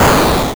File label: Rage Dash (Sound); Gloom